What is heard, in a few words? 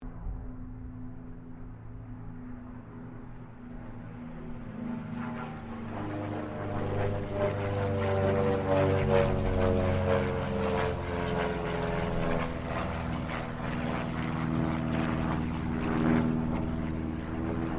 AIRCRAFT CT4A PASS SLOW, RAAF CT4 training aircraft; Airplanes; Vehicles